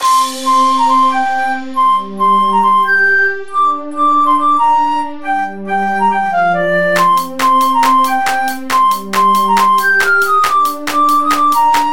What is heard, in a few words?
Flute